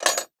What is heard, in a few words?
Foley Lock Metal Lock Movement Short